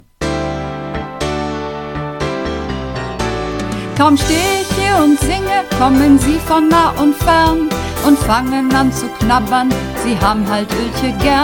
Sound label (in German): Werbemusik Hoch, Uebungsaufnahmen